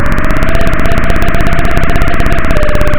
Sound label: Sound of a plane taking off